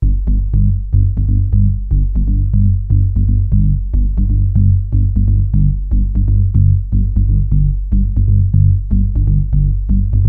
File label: bass loop 120bpm